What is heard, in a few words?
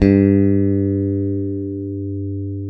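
CLN STRAT, Synclavier, GUITARS; Sampler Library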